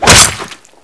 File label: katana hit slash, weapons; cso